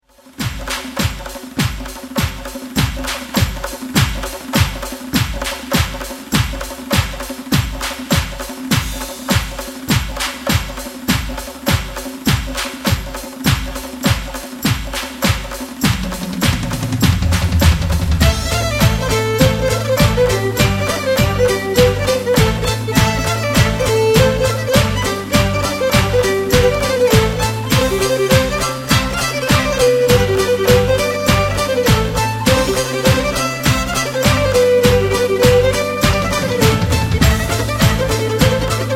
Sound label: Lezgi (Instrumental)